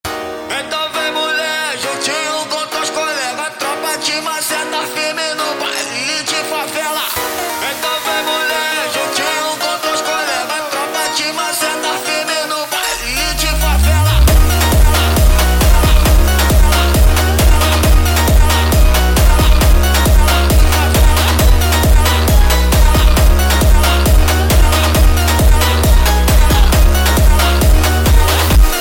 sped Up, funk

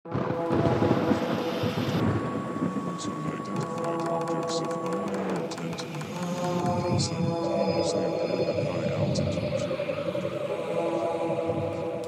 sped Up

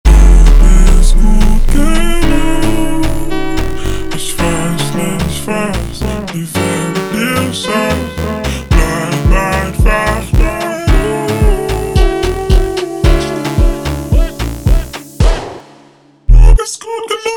Funk slowed, funk

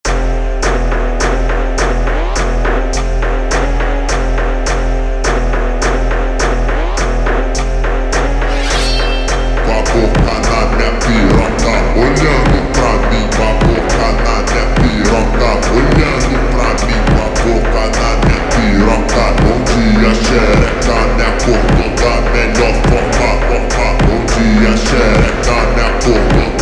Samurai Funk over Slowed reverb

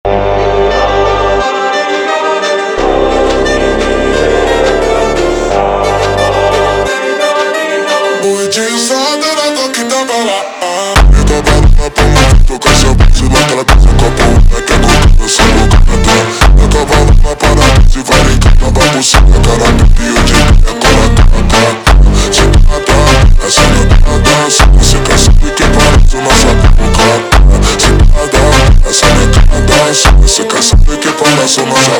Funk Risada super Slowed

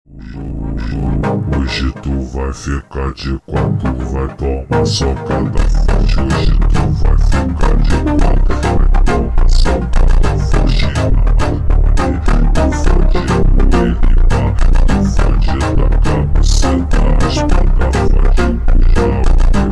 super Slowed, funk

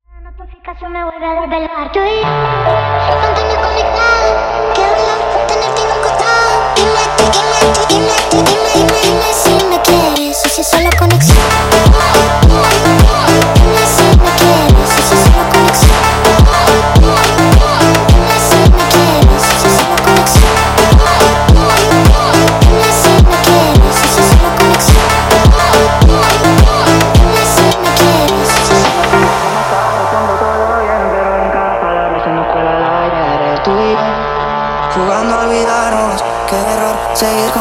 sped Up, Phonk

